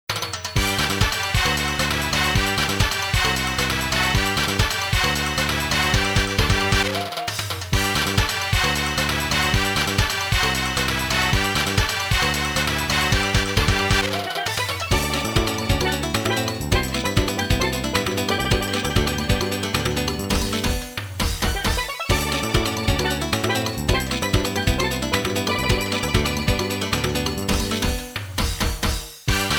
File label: Samba of sea; Pocket Fighter remix; Capcom Sports Club